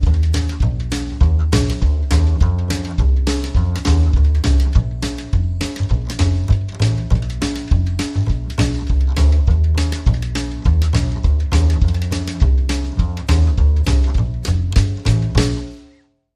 Down Home Bass

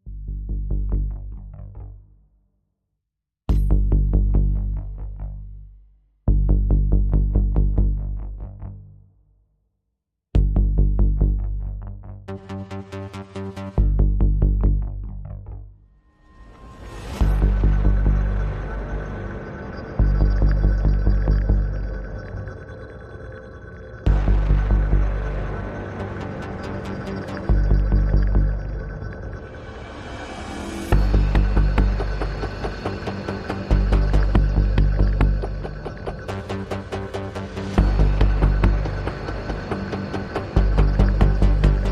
Drums Bass and SFX Only

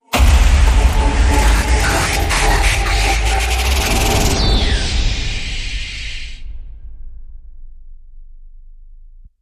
Hit; Sttr Discordant

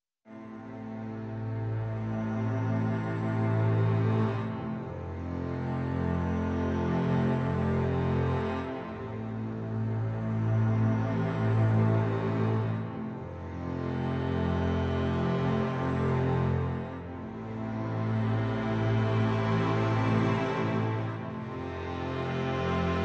Legato Only Mix

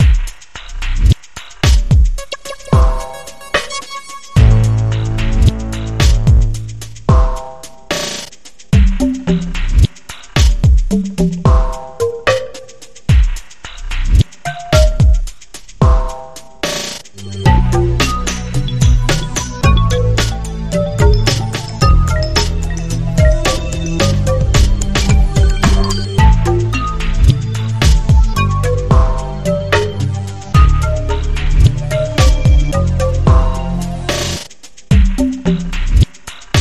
Japan Drums

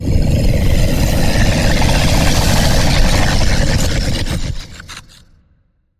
Sea Serpent Wrath

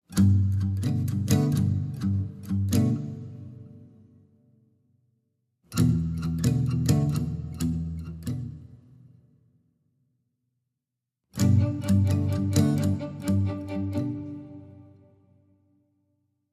No Lead Viola Mix

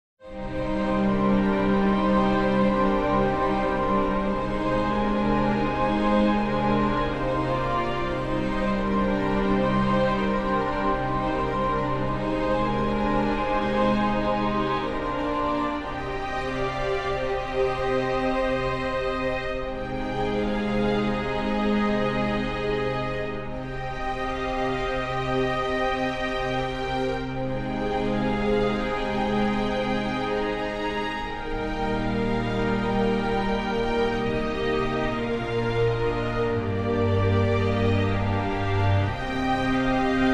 Moments Of Grace, No Harp

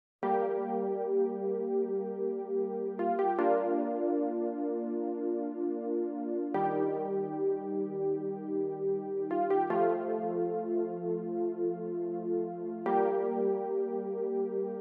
No Drums Reduced Arrangement

